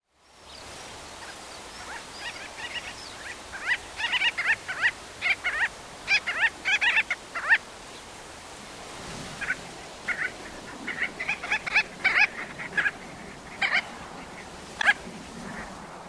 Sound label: gull billed tern